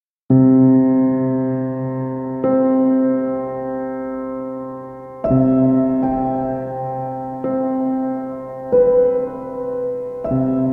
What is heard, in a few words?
Piano Sounds